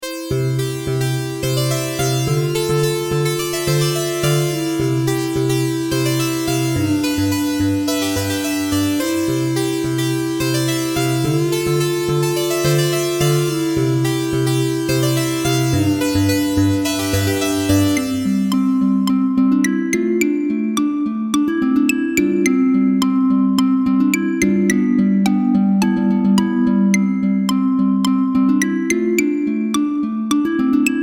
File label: choco birds naptime